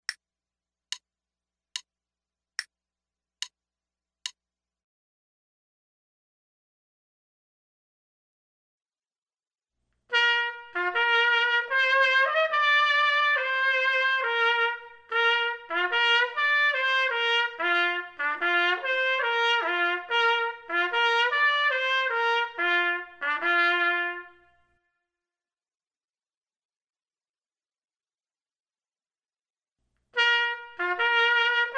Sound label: trumpet; Selected Duets for Trumpet Podcast